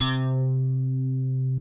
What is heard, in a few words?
Synth hits and riffs